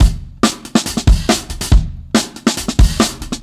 Big Brown, Breakbeats, Drum n Bass; KJ Sawka; Drum n Bass & Beyond

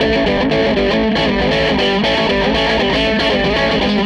Tele Guitar Loop

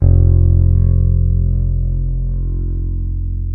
FRETLESS F1, BASS; Northstar - New Gold VOL-2

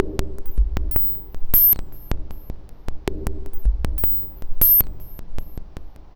Mod Loop, Modular Percussion

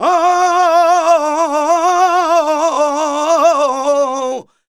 voc c, Flamenco Vocals Male Cantaor

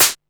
HAT, Techno 138 BPM

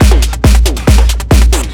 drm 138, Techno, drum-loops; Techno ID; rhythm

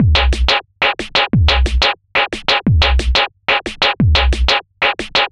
offbeat punch 090bpm; Zero-G; Downbeat Dance Grooves

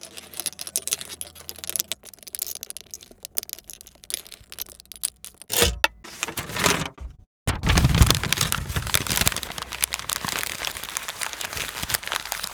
Real Recordings

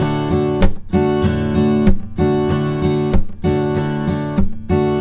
riff 96 D, Blues Guitar; ILIO; Fretworks

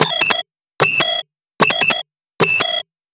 glide

